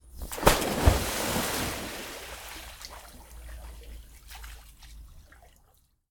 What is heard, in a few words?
Water Splash Big Pool Jump